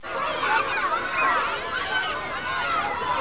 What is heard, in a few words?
costons kids play